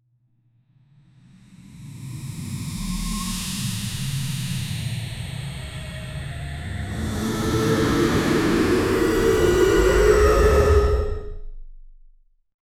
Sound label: superhero sound effect th, build up